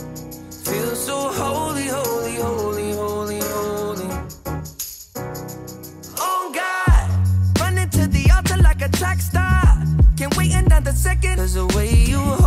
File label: ringtone english ringtones